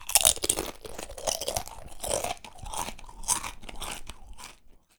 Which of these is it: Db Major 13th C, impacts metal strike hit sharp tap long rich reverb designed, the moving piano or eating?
eating